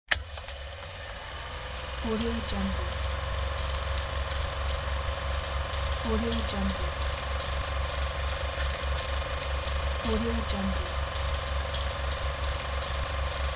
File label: old film projector